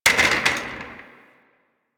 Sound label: Office Chair Impact